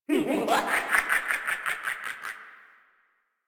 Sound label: Laugh; Warframe; minigames; Caliber Chicks minigame; boss